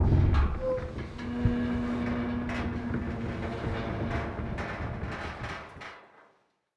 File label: door large metal open start; Rust